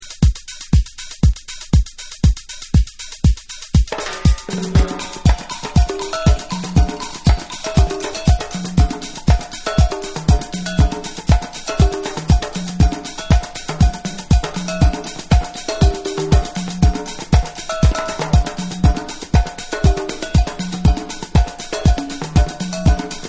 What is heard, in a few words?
Drum Mix